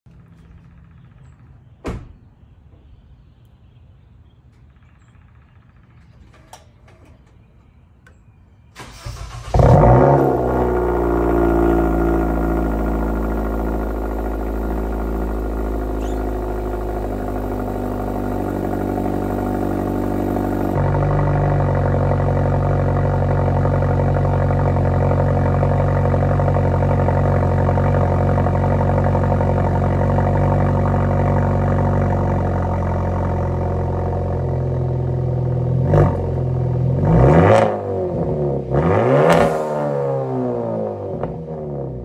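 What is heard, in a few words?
Hyundai Elantra, exhaust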